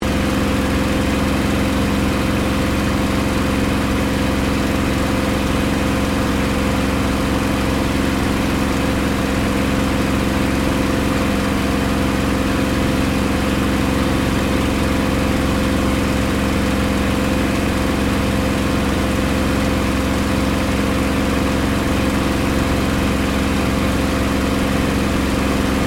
AIRCRAFT SUPERMARINE SPITFIRE MK-VIII FLYING INTERIOR; Airplanes; Airplane Sound Effects